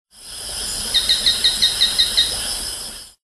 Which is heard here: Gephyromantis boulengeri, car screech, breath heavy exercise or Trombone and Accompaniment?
Gephyromantis boulengeri